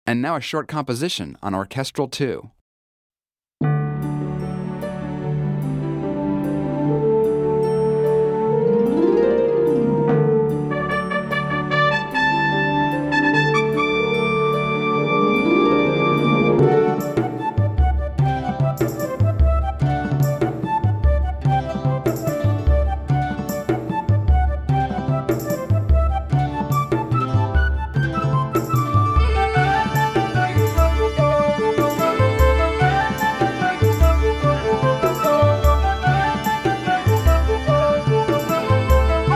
orchestral II; demo song; 16-bit, 44.1 kHz stereo; xp-80; roland; roland demo cd-3

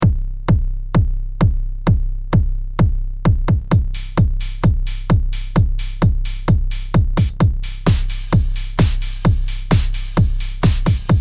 Passion Drums; fantom-x8; patch demo

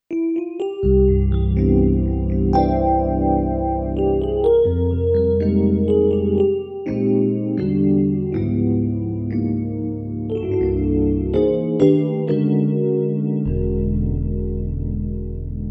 Soft Rhodes; xp-80; roland; roland demo cd-3

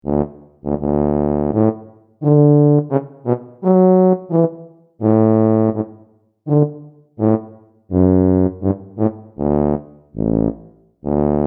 Grand Tuba; fantom-x8; patch demo

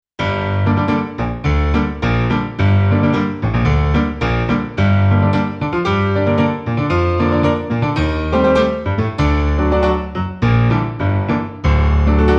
On Mic Soft Pdl; patch demo; xp-80; roland; roland demo cd-3

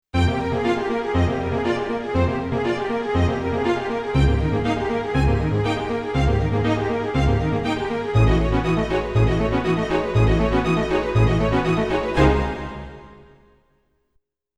Fat Marcato; xp-80; roland; patch demo; roland demo cd-3

